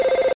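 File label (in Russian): глухой одиночный звук телефонного звонка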